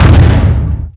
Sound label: Large Impact; Phoenix